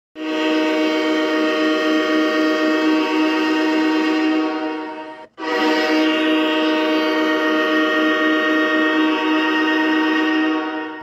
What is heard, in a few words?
loud train horn